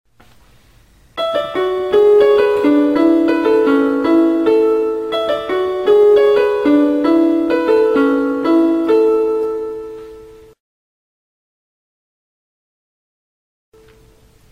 tone in piano; blues; nokia

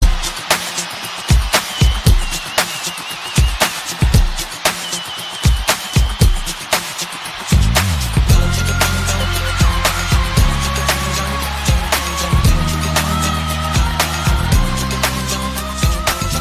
Battery Guitar

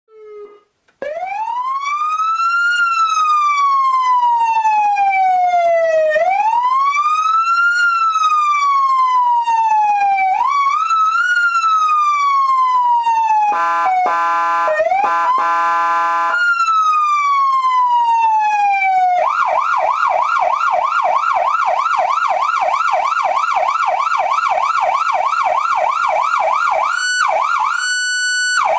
federal signal pa300 siren

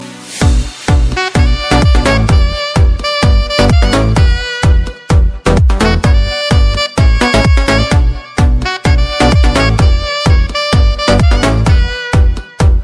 alone sax; dance

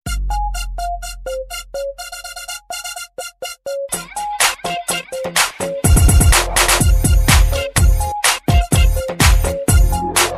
bgm

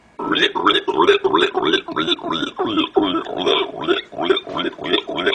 weird noise; Hinata

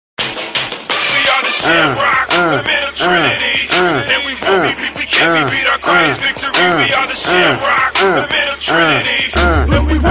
Hip Hop

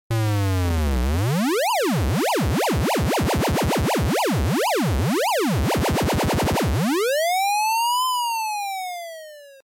Twist fx

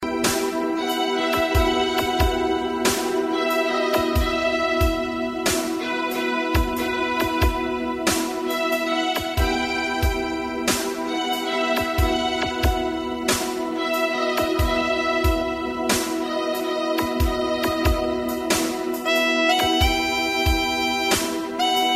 slow idea; tycone; blues

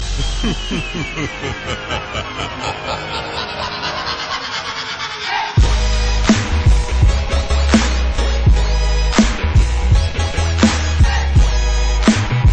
beat so fly, hip hop ringtones

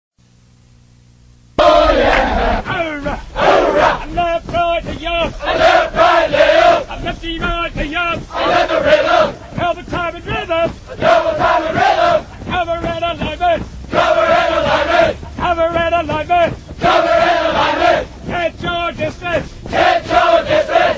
marine corps running cadences

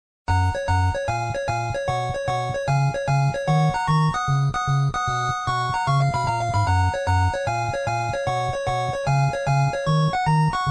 Game Over

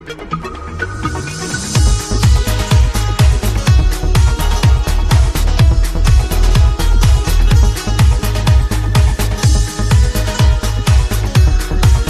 farsi ringtone; reggae

